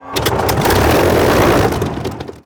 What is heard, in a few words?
engine start, stuka; gmod; lvs planes